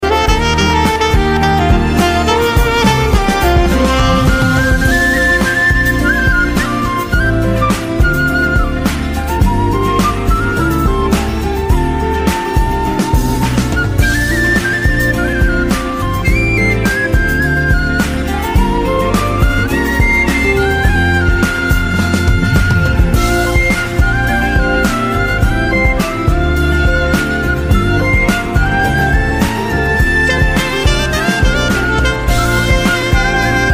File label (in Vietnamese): không lời